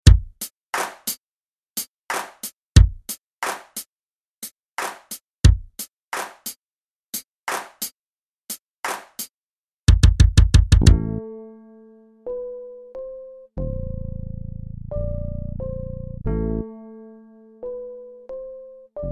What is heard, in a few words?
Midi Ditty